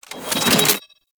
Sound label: Spear Gun Mech Recoil; Hoplite